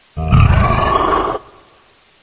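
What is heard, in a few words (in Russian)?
разносится ужасающий рык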